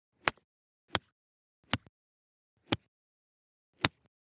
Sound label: golf club iron; stereo; soundscrate